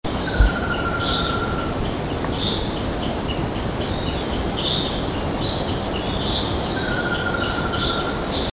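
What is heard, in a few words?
helmeted vanga, Madagascar; Madagascar - 20th Oktober to 16th November 2003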